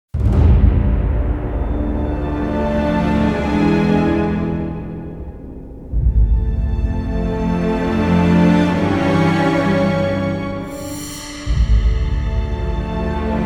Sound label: no choir